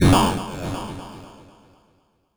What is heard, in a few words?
Echo Shot; Sonic Foundry (Sony Creative Software); Dr. Fink's Funk Factory